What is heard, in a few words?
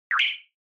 Accent